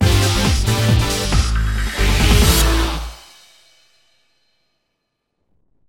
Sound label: Jingle clear; virtua tennis world tour psp gamerip 2005